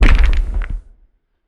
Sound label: Heavy Stones Falling